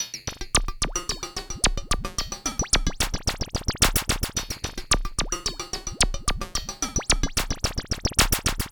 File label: Electronic Percussion